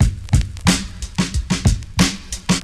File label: BREAK